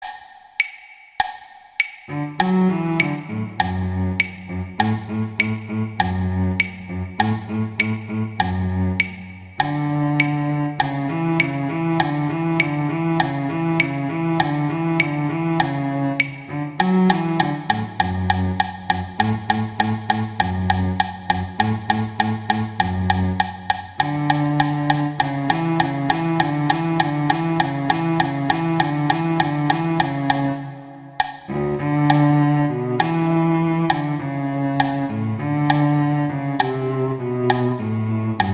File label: Cello; Cello I; Mozart; Duets